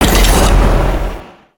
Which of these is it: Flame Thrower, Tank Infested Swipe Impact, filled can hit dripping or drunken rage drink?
Flame Thrower